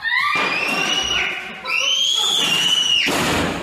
kid screaming; meme

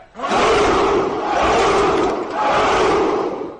spartan howl

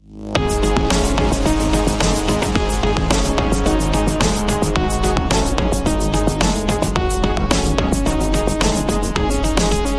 win theme bass boosted; roblox rivals

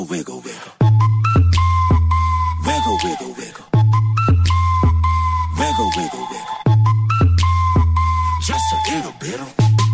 wiggle assobio

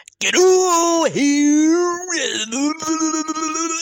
tounge sounds; get over here

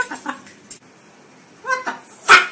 parrot

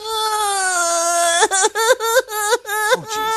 crying; mort

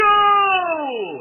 goal

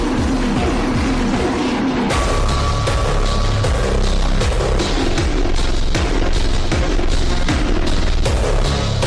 minigame sound

